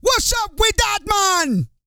REGGAE, Vocal Planet, World Voices; ILIO; Vocal Planet VOL-5

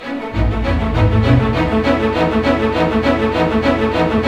BIKE; East West; Scoring Tools; ORC; Bike Ride A

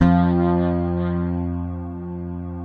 Fingered bass F2 L; Sampleheads; Will Lee Bass Library

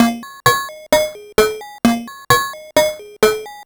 Sh101 Loop, 130BPM

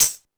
999 Tamb, drum kit; Concrete Collage Drum Kit; Concrete-999

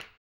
HAMBONE, Vocal Blues Soul; Spectrasonics; Planet

